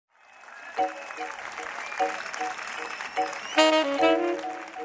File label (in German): musik Test; Tinnitus Musik Therapie